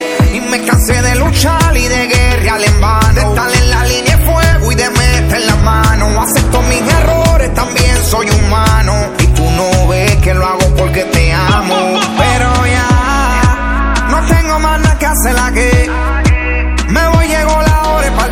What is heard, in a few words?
Car Music; PUBG